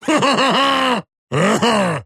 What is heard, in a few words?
angry madness; Axe